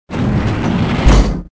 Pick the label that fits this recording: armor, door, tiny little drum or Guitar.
door